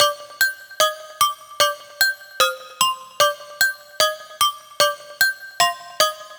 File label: Synth Loops G 150 Horror; Trap and Dubstep